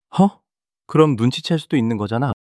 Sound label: surprise